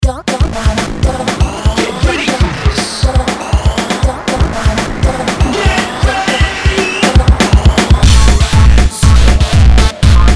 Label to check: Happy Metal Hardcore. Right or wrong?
Right